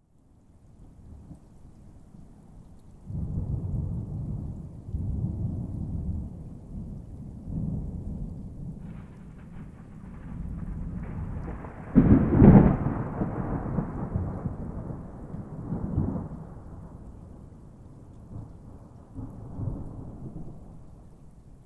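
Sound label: Distant Violent Thunderstorm, Outdoor Ambience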